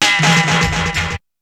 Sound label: SPACE DRM; BEAT2000